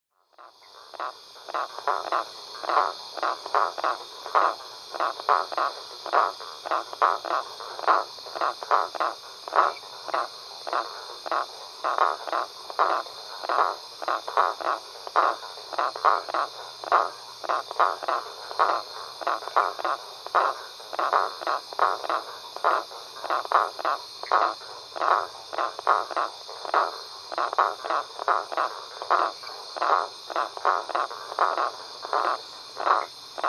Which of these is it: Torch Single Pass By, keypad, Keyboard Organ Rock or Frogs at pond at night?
Frogs at pond at night